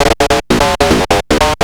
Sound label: Atonaloop, Industrial Analog